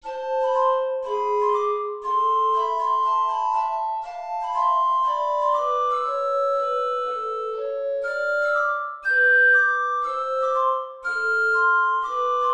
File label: easy jazz